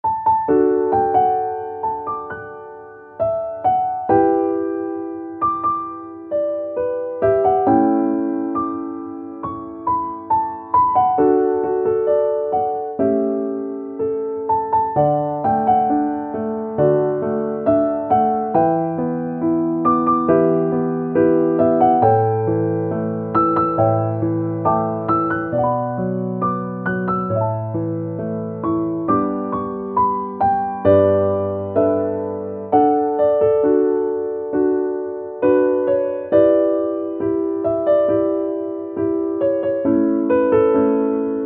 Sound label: piano; 優しい ゆったり バラード; そっと交わしたゆびきり。あなたと私だけの大切なもの。